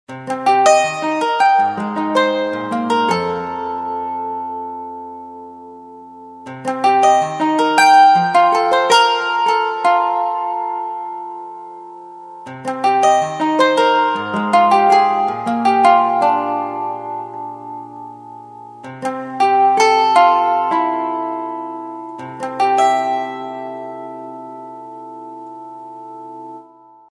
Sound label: Guitaring; HTC HD7